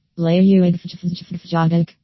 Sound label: farty